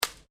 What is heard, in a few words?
Gun Toy Dart Wall Hit; Hits; Objects